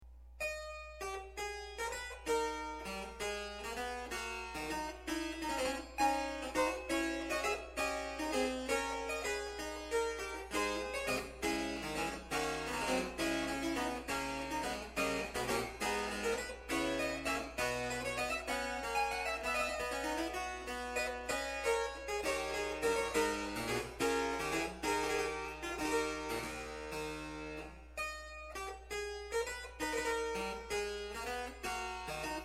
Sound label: gigue; froberger